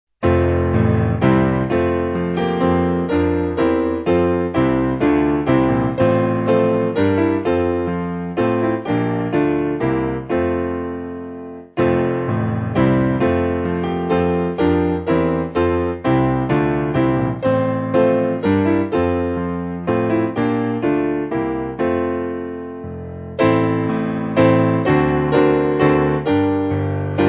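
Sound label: Piano, hymn backing; Love Divine; Hyfrydol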